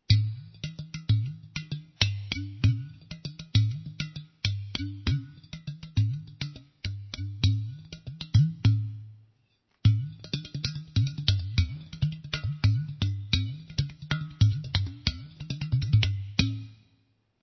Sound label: udu